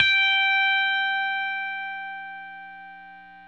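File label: electric guitar sus G6 left; Sampleheads; Whole Lotta Country 1 & 2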